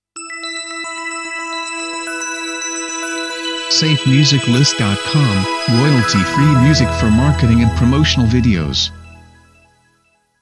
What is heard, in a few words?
Bright Bells Audio Logo; SafeMusicList